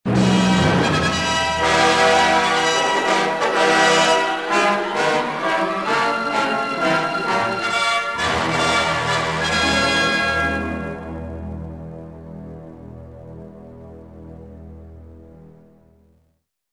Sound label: swamp, music; Star Wars Jedi Knight I Jedi Academy; XBOX; yavin